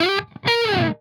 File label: Electric Guitar Lead; macos gamerip 2021; SongPop Party